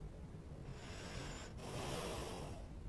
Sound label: breathing; Kerbal Space Program v1.0.5; Chatterer; AAE